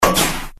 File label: Bullet impact metal; this is the zodiac speaking 2020 gamerip switch ps4 xbox one pc macos